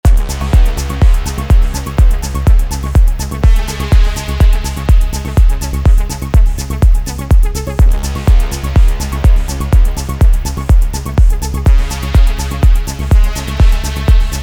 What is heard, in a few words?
Kit Melodic